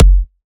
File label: kick f